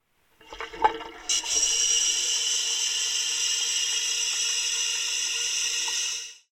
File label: flush hiz